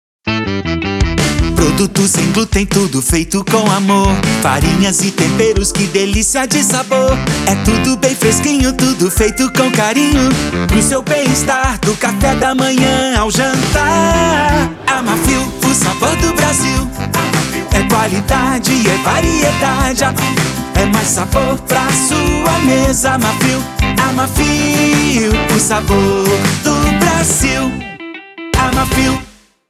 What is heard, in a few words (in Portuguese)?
Jingle